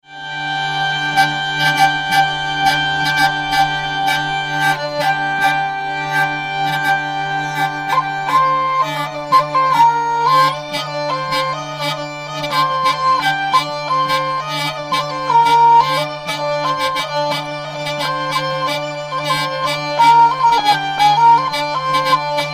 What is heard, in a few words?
vielle; baleu